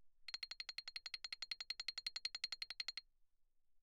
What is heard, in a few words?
xylophone rosewood roll ff C8; Percussion